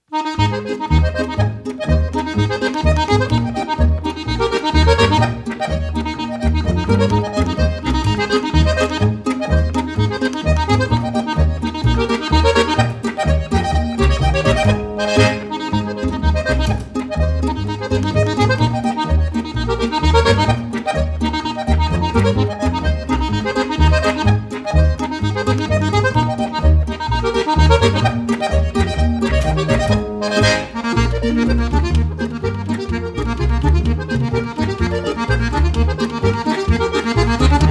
Polka

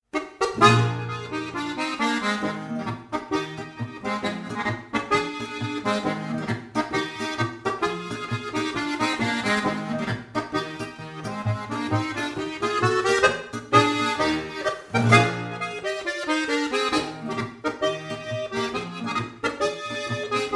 volksmusikstueckl

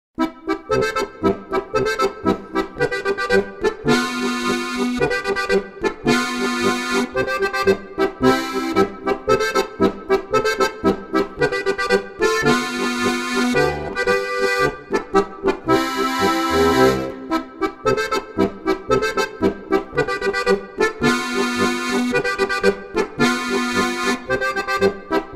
polka

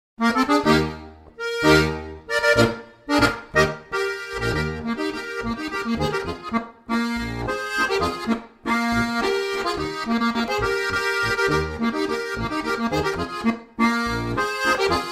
landler

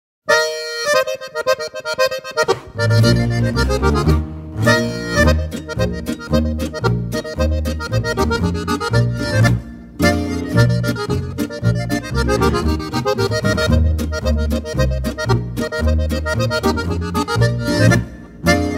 Boarischer

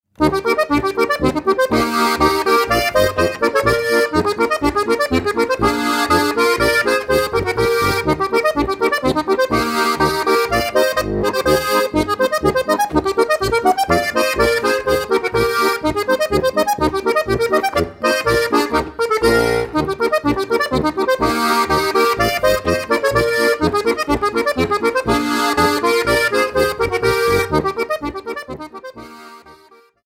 Polka

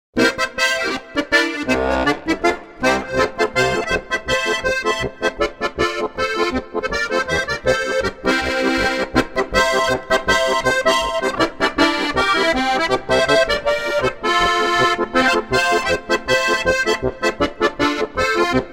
Polka

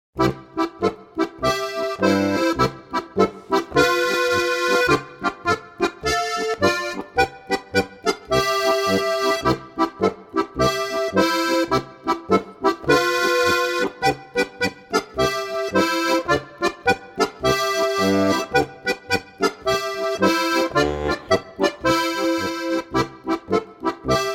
Polka

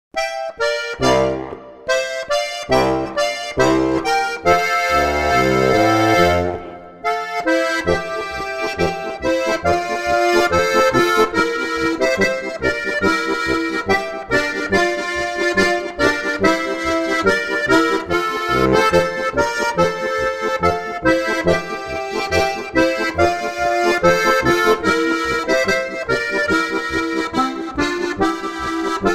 Polka

